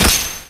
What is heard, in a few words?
keyblade